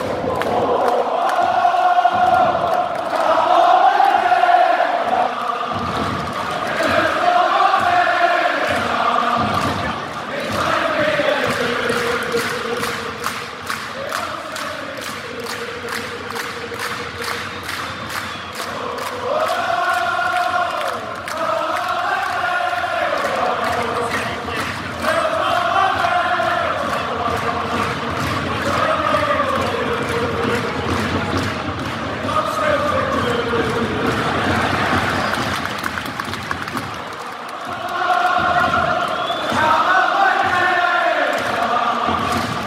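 celtic players chant, football songs and soccer chants; callum mcgregor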